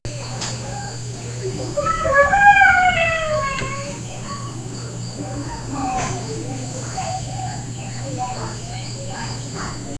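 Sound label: ambient sound of early morning, asia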